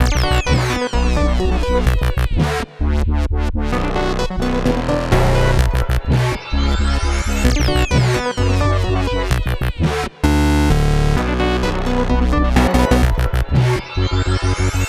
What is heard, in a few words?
Trance Out Bm Full Mix, Complex Electro Synths; Prime Loops